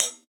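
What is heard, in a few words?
Hihat closed Sm58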